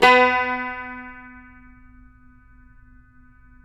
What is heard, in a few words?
CUMBUS B, Guitars; Spectrasonics; Hans Zimmer Guitars VOL-2